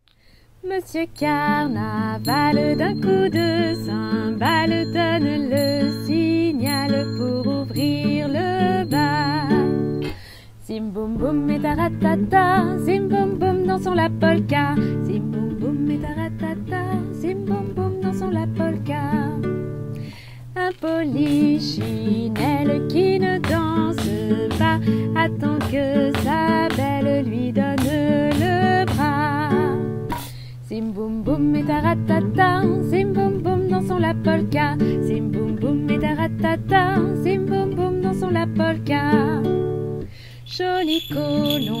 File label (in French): Monsieur Carnaval chanson de carnaval eveil musical